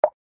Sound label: water droplet; ion sound